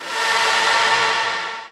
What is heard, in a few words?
fx one shot intro